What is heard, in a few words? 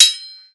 sword